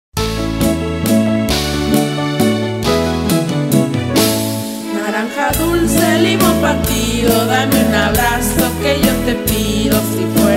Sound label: naranja dulce